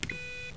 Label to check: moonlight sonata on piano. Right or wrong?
Wrong